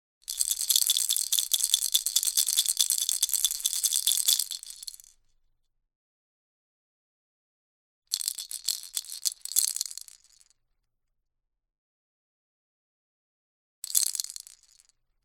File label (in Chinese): 恐怖场景